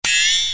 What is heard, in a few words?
ding